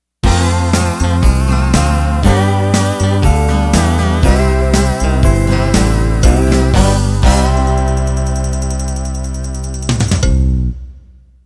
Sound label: Modern Country End